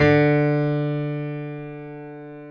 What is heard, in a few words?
PF, B GRAND PF